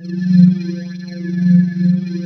Korg, FX

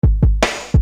Beat, Hip-Hop Street Beats

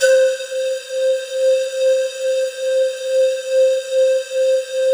C4, ANALOG SEQ; Roland JV 2080

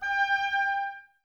OBOES G; Miroslav Vitous

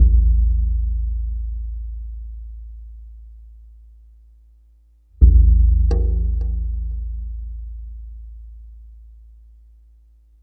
Tribal Wave Drum; Sonic Foundry (Sony Creative Software); Ma Ja Le Ethereal Textures; Worldly Rhythms

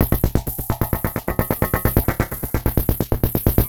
Beat, Industrial

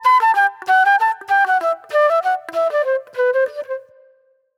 Pop Flute; Sonic Foundry (Sony Creative Software); Manhattan Flutes; Slinky's Place

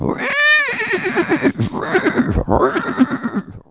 HORSE

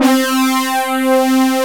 SYN BRASS, swing synths; Zero-G - Funky Elements

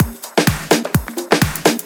Latin Loop 128 BPM

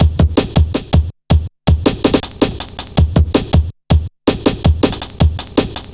Boy, Drum Loops, Jungle, 161.5; Zero-G Jungle Warfare 1, 2 & 3

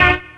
car horn; E-MU Formula 4000 Series Vol. 2; Dance Hits

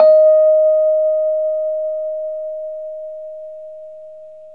Rhodes D#; 3D Sound; 3D Keys VOL-1

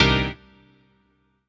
CHORDS; EastWest; Dance Mega Hip Hop Electro

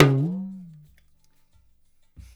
TALKDRUM; InVision Interactive; Percussion Slam; ZOMBIE

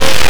glitch thing

